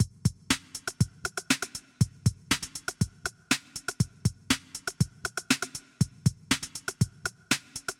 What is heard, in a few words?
Old School Hip Hop